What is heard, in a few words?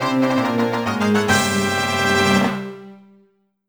Boss Defeated; Super Mario 64 DS; Wii U